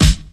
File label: 2000s nice hip hop acoustic snare g sharp key